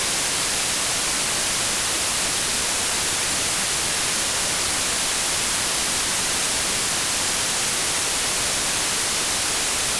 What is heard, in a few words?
White